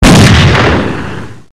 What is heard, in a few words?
Bomb Blast